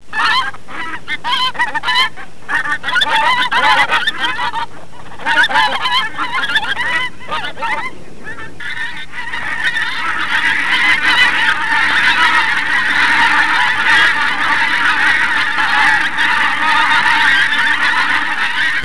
GANDER, 鹅; 动物; 家禽; 特酷声音素材库

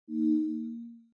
Button

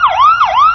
fireengines; 暴力赛车

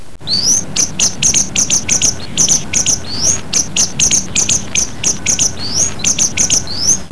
Bird; 特酷声音素材库

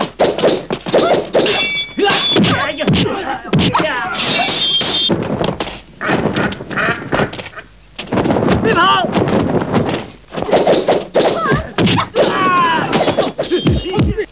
Fight; 特酷声音素材库

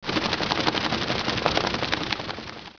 Bird; 特酷声音素材库

